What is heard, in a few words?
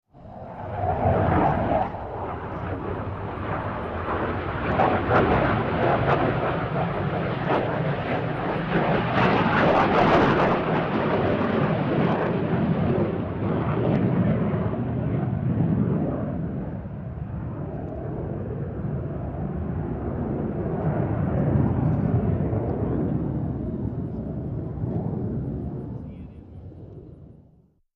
Grumman A6 Intruder